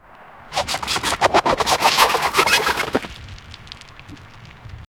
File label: a sudden tumble of pebble, river